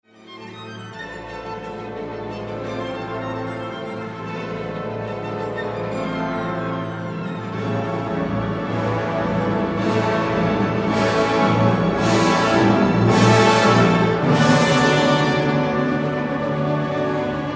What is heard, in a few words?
Violin Concerto; Sibelius